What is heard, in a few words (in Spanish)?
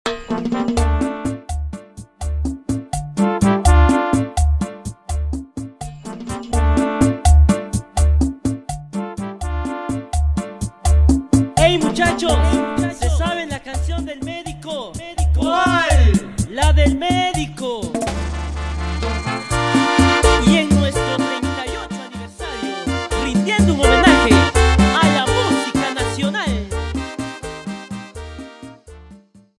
Mix Tonadas 125.000BPM; DJ Waon